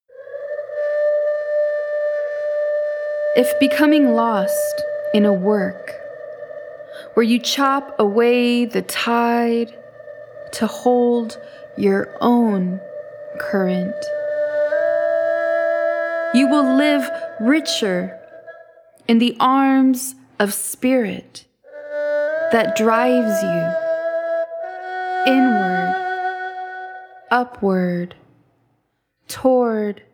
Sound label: Ocean Mantra